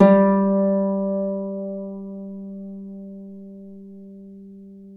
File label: Celtic Harp